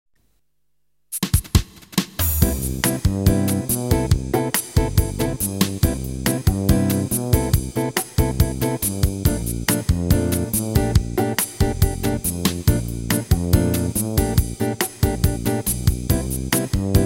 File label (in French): Bossa